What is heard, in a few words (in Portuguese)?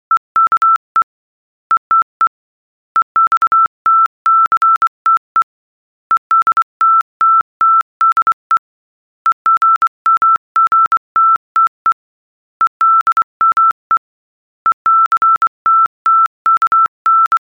ritmo velocidade